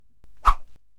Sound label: woosh